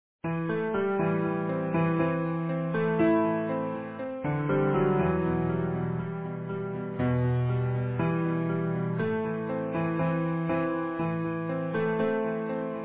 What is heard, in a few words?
Easy Piano